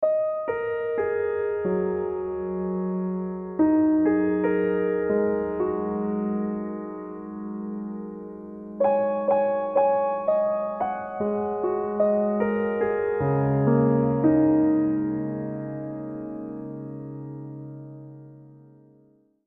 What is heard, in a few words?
Piano